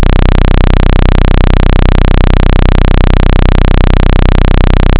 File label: simple snd